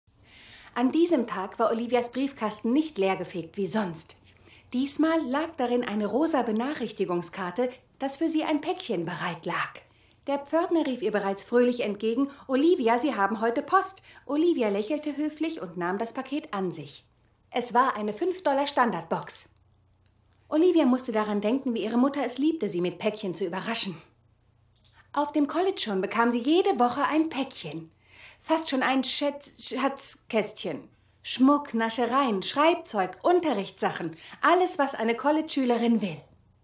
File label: laugh killer laugh